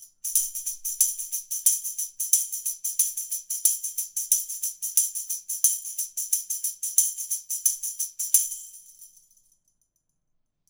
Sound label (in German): schellenkranz